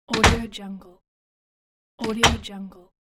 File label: closet door closing